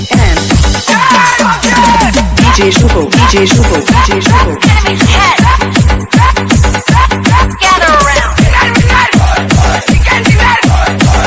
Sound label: cool ringtone; pubg new